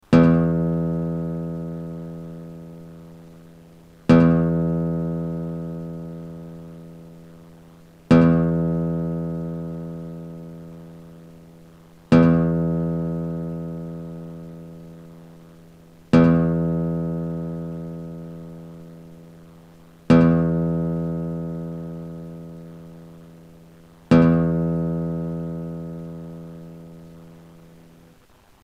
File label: E 6th string Guitar